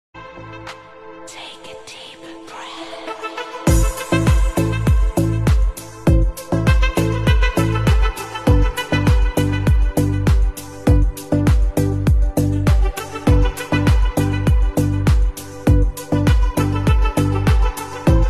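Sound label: arabic ringtone bgm ringtone deep breath ringtone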